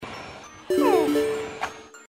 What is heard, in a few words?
finished task; gamesoundopedia